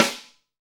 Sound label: SD, Real Kit; 90 s Sample CDs; Sound & Vision - Gigapack I CD 1 (Roland); Real-Kit m14